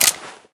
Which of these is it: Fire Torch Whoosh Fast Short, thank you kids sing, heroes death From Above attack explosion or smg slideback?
smg slideback